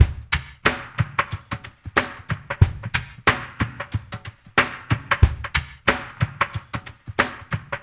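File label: drum mix right; Spectrasonics; Liquid Grooves 1 & 2; CAVERN SW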